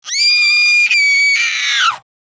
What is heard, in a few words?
High pitched scream girl child kid; gfxsounds